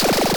weapon machinegun loop, Retro Game Weapons Sound Effects